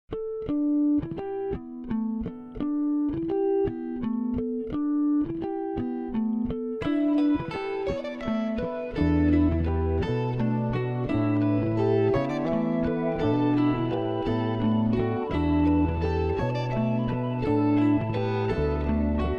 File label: Harmonics